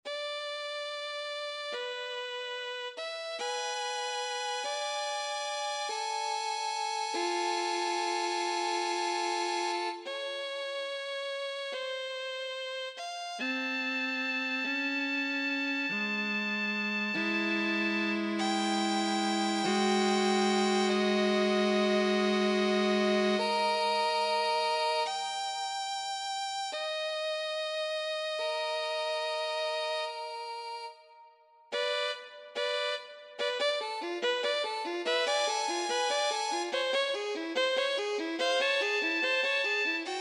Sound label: Violin Duet; ensemble pieces